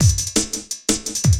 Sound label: LOOP, Drum & Bass, 170 BPM